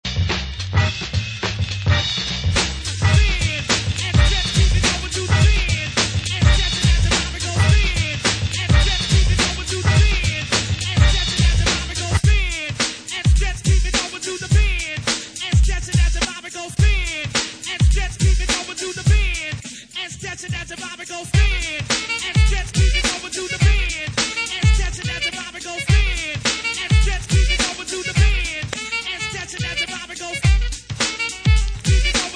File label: party groove (instrumental)